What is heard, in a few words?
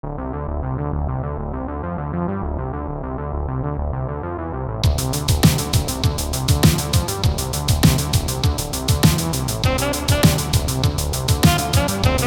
saxo; CC0 Scraps